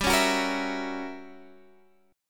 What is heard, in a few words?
F sharp 7th G, chord; strummed; JGuitar